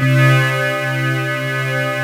TUBE ATTACK, TECHNO; Pro-Rec - Synthsonic; Z1 TECHNO

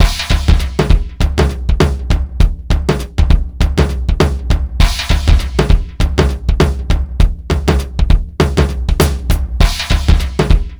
JAZZ, Funky Xtreams; INIS

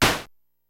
ANALOG DRUMS; Rubber Chicken Software Sample Bank; DRUMS + PERC

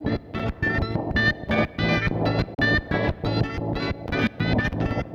Ambient FX, Urban Grooves; Sonic Foundry (Sony Creative Software); Stylus Pressure Urban Grooves on Digital Wax; Summer Jam

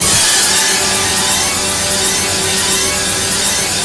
G4 ORCHES; Northstar; The Wizard VOL-1; ORCHESPHERE 1

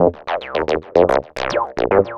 UR 303, 303 loops; Sonic Foundry (Sony Creative Software); Ugly Remnants VOL-2

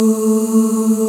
vox A2; Vox Percplex'd

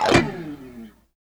CHORUS LID, Guitars; Spectrasonics; Hans Zimmer Guitars VOL-1; FUNK CHORDS

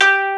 Koto G3; Proteus 2000; Digital Sound Factory Proteus 2000

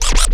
Scratch Up; Mo' Phatt; Digital Sound Factory Mo' Phatt

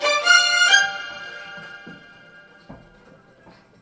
STRG; USB Soundscan vol.32; Roots Disco; AKAI

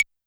Claves; YAMAHA RM1X

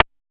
Pollard Perc, Syndrum

